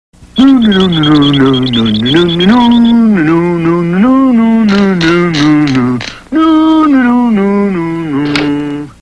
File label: Typewriter